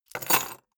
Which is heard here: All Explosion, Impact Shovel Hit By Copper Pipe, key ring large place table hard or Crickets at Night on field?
key ring large place table hard